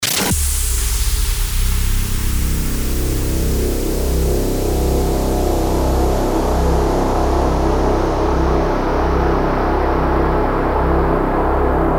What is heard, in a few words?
DRONE, 142BPM CMIN